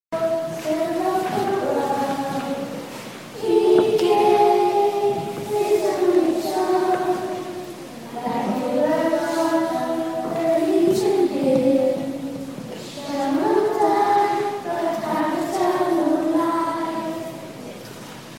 nativity children's voices; nativity in london

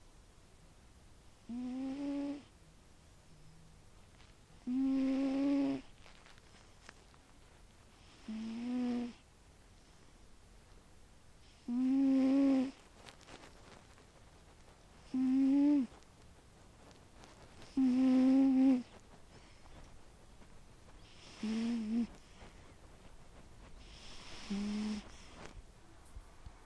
cat snore

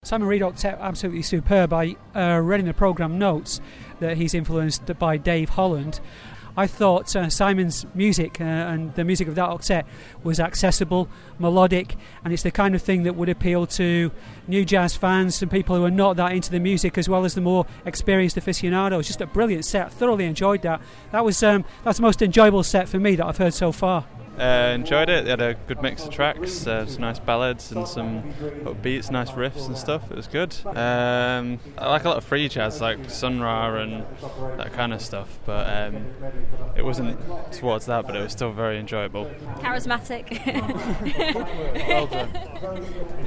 audience view octet

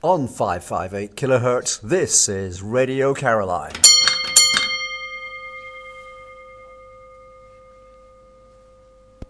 ding ding

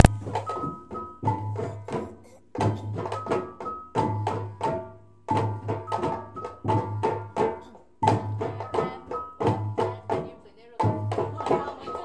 Samba

